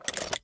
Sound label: toolbox remove; toolbox sound effects; tbd-station-14; Items